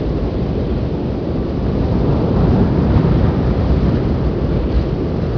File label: wind; quake2